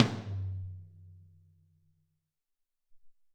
Verb Tom, Alesis SR-18

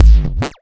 Fat Kick Burp, Cwejman S1

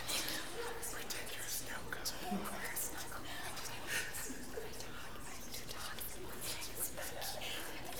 WHISPER; WHISPERS

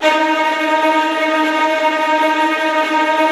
STR TREMOLO, Strings; D#1

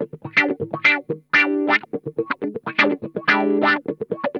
WAH, Funk Guitar; Zero G - Funk Guitar

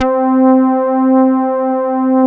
Poly800 C3, Korg; Back In Time Records; Korg Universe VOL-1

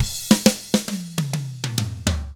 ROCK, Drums

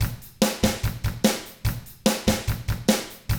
rock drums

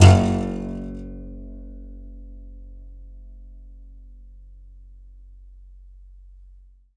C. Bass Balalaika, Plucked Strings

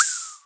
HH CLOSED, Disco House; USB Soundscan vol.20; Fresh Disco House I; AKAI

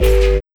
808 Bass, Fx

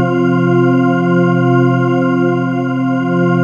PADY CHORD

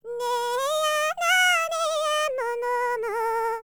BULGARIAN, World Voices, BALKAN

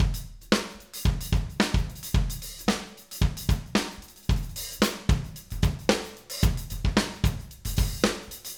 Acoustic Live Drum; Platinum Audiolab

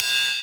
Rides, Cymbals; Vengeance - Essential Clubsounds Vol.5

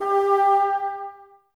BNS G 4, Bassoons, Wood Winds; Miroslav Vitous - Woodwind Brass Ensemble; Bassoons Links

